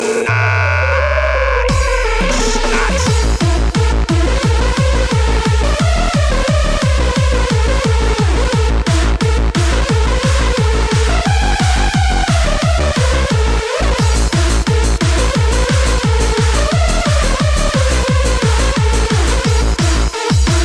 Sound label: gypsy disco